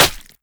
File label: flesh, bullet impacts; physics; Half Life Model Pack